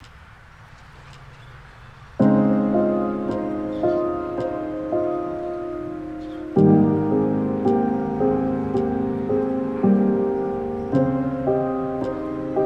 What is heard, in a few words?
lofi hip hop